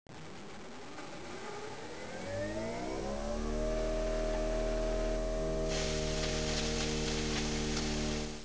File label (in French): Machines Son